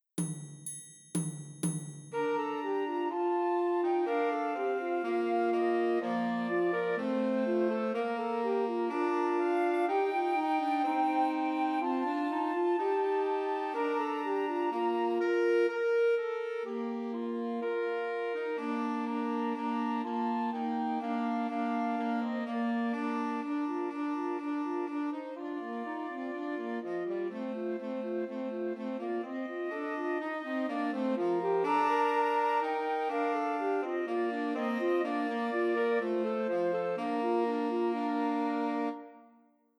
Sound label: Joy to the World, Christmas Trios for Flute Alto Sax and Tenor Sax